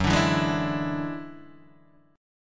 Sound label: F sharp 7th Suspended 2nd Sharp 5th F sharp down Guitar Open D